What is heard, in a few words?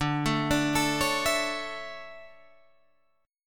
D Major 7th Suspended 2nd Guitar Drop D, chord